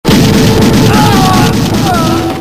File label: shotting